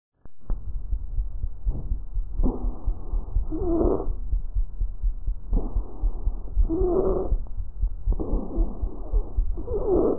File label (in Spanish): Estridor Adulto